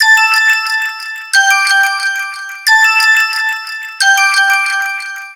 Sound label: ringer; Fresh; ZTE; Open-C